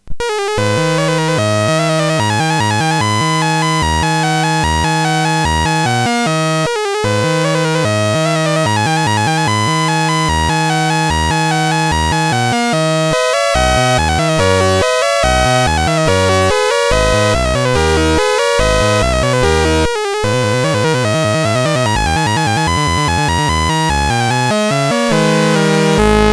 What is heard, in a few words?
sid CSG8580R5; Videobreak 08 BASIC